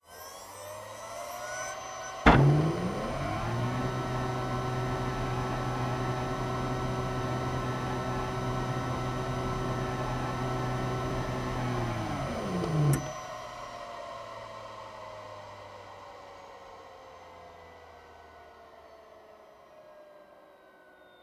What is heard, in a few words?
machine office, ambient; nuke